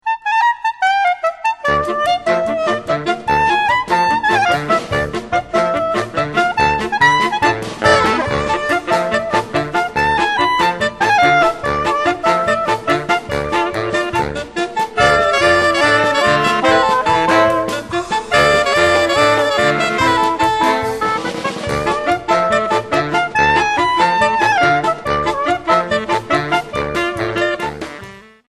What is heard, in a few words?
klezmer, Saxophone Quartet